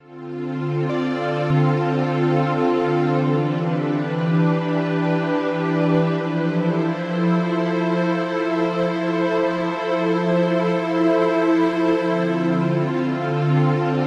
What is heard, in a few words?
pad warm strings; lovescape